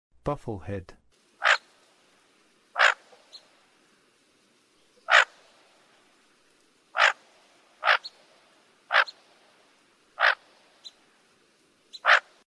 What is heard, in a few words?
Bufflehead; North America